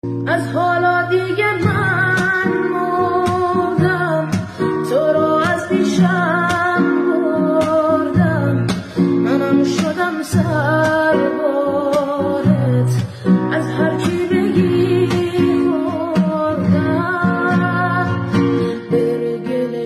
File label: music sad; آهنگ غمگین گریه آور مخصوص اینستا